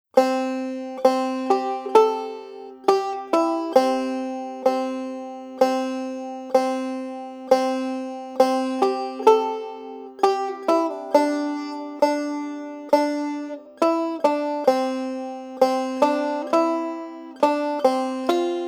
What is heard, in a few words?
Melody; New River Train